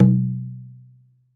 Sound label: Tumba, Latin Percussion One Shots; Sonic Foundry (Sony Creative Software); Joe Vitale Latin Percussion